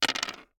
DICE on Hard Wood, Throw and Roll, Standard, Three Dice; Samples; ArticulatedSounds; DICE Sfx Party (Extended)